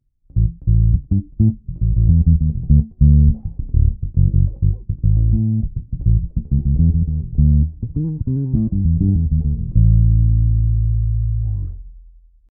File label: Markbass MB58R CMD 102 Pure Fingerstyle DI